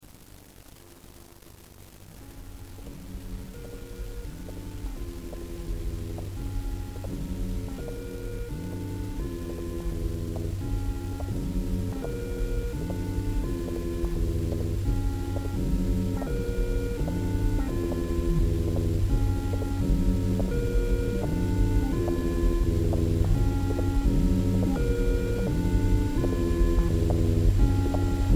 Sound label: noise suite